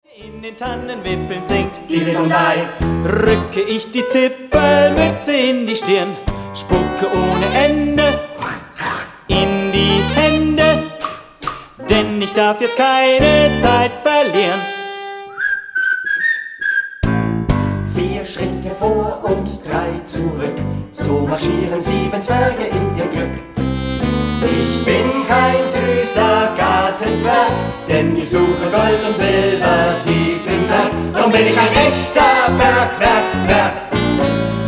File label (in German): Zwergen Marsch